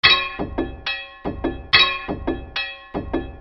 TRAINS

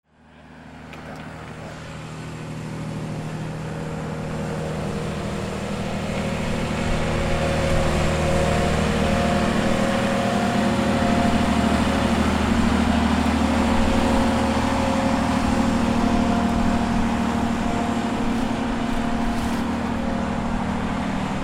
BOATS

